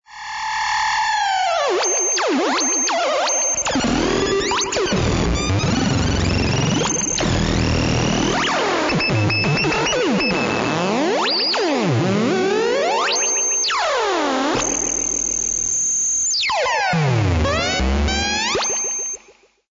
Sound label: LFO